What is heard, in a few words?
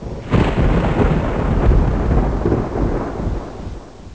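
thunder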